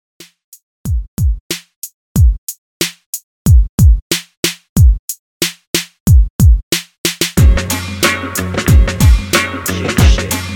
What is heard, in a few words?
90s Redrum 92 Bpm